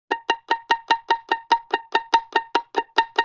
Pizzicato Loop; Musical Elements

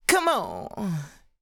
Dry Chant - "Come On"; Cymatics; Euphoria Vocal Sample Pack; Chants

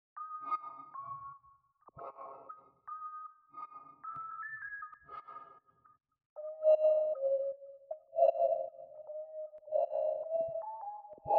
155 BPM C# Min Synth, Loop Stems; Melodies; Cymatics; 2022 Melody Mystery Expansion; Freezer

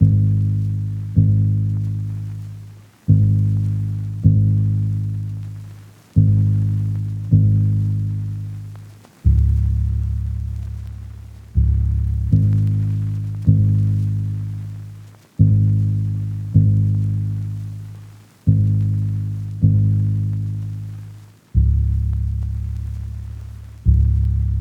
78 BPM G# Min Bass; Lofi; Melodies; Loop Stems; Cymatics; Lofi Starter Pack; Winter Bliss

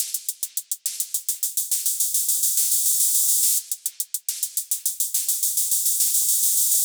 Hihat Loop - 140 BPM, Experimental; Chael

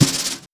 Brass Fast Brush Sweep, Snares; Drums; Ghosthack - Free Snares 2023; Blk Brass

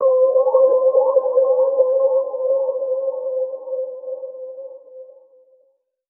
SYNTH (C); Lost; Cymatics; Mystery Pack Vol 4

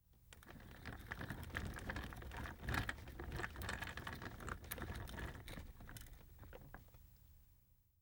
Cart movement; Fairlight Sound Lib

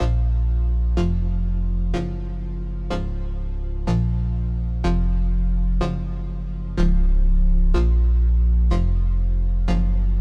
124 BPM A# Min Chords; Loop Stems; Cymatics; Reasons; Breakdown Loops

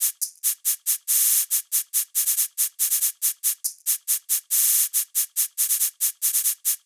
Hihat Loop - 140 BPM, Hip Hop; Drums - Loops; 9God

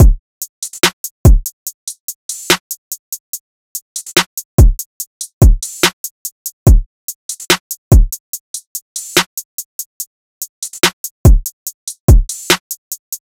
Drum Loop 144 BPM, Trap; Cymatics; ANALOG EVOLUTION Beta

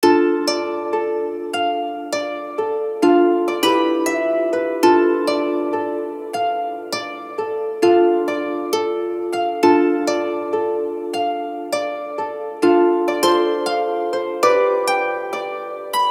Harp - Am 100BPM; Construction Kits; STEMS; Ghosthack; SkyRealm; Kit 10 - Windhelm Forest

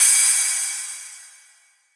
Crash Cymbal, House; GhostHack; Ghosthack Advent Calendar; House Kit

